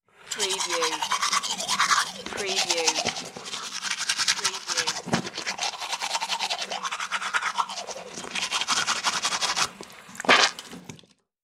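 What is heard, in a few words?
Brushing Teeth Water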